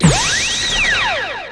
solar flare

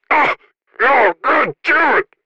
wound

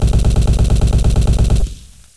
war, war zone

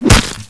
hit

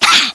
ted attack

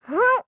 jump long jump; sunanekodesu

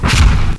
air exp; observer

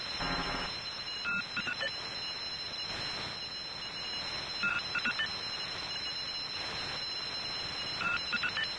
computer tech

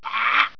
trooper pain

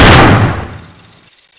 shoot, weapons

